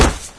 flesh impact hard, physics; Source Engine; video game

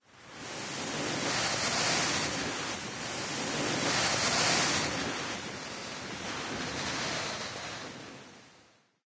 tree wind, forest; Left 4 Dead; Source Engine; video game; ambient

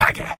sniper negative vocalization; tf; mvm

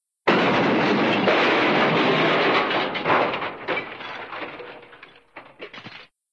crunch, ding, tinkle; cartoon; hannah barbera cartoon sound fx

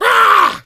hunter shred, attack voice

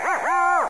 servo scared, scanner; video game; npc; Source Engine

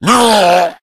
hulk pain; Left 4 Dead; Source Engine; tank

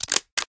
trade up apply sticker

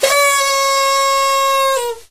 sad party horn

